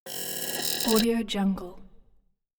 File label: scifi large robot function buzz